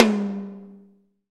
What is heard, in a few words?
TOM TOM, Roland